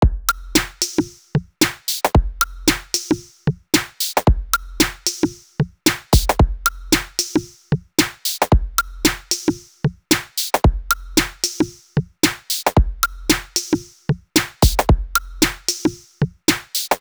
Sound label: Drum Patches; Primer